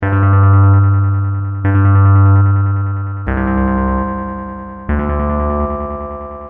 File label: synth